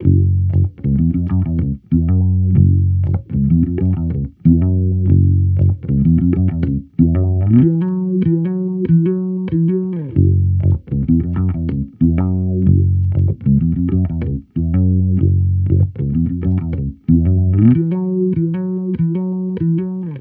Amped loop Groover 95 F#m, Electric Bass Loops; Slate Digital BASSHOLE Sample Pack

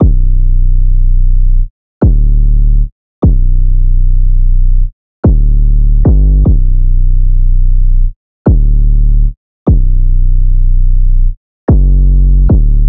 808 loop 149 Bm; Slate Digital BASSHOLE Sample Pack

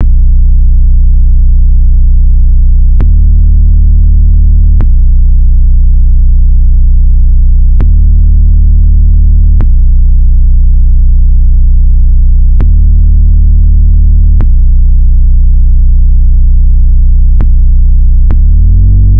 808 loop 100 Bm; Slate Digital BASSHOLE Sample Pack; 808 Loops

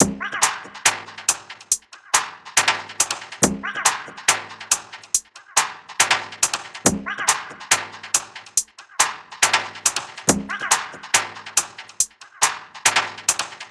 perc 140; Drum Sample Pack; Loops; SD SEISMIC; Slate

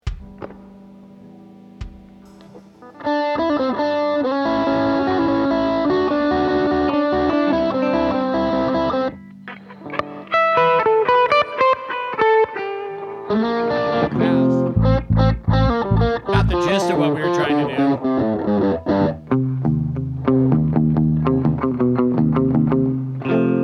Strings Emphasis

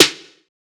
snare strain; one shots; SEISMIC Drum Sample Pack; Slate

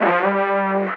vocal desire Gm; Slate; AURORA Sample Pack; Vocals

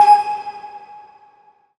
tonal one shot Ab; Pop; Slate; PASADENA Pop Sample Pack; Tonal One Shots

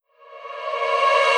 reverse, vocal sample

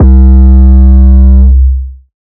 808 Bass Melodymaker C3; Slate 808 Deep Dive; SD Signature 808s

